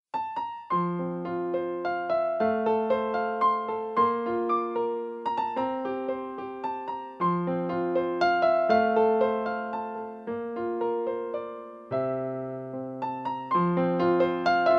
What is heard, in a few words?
Waltz